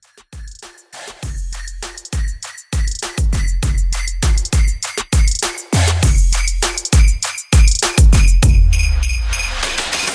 Christmas Re Drum

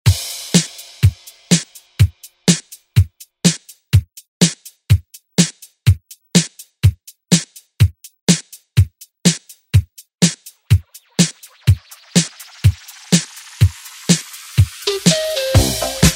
80s ReDrum; DJ Mhark

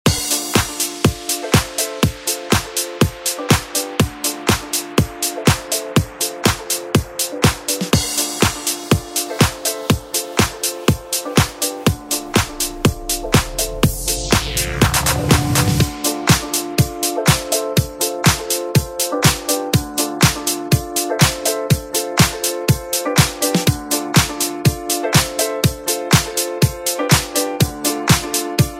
Dance Edit; Deejay Hit

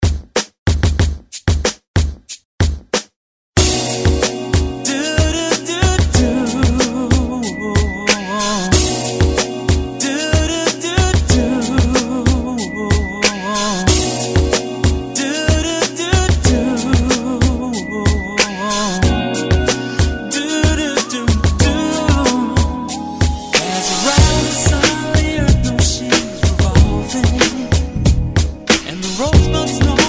90s ReDrum 93Bpm; DJDOLLS

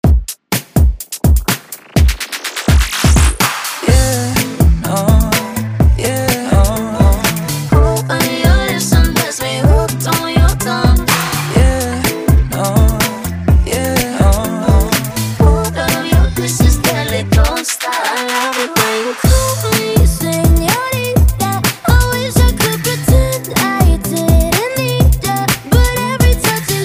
Break Beat Mix Hype

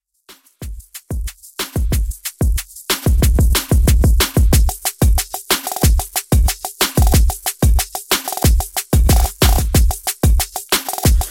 Latin Moombah 92 Bpm

